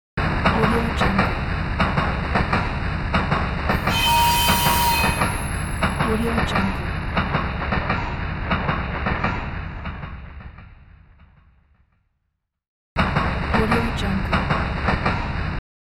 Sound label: train